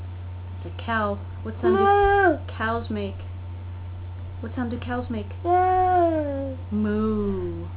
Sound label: moo